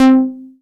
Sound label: SEQ C4; Q Up Arts; Dream Experience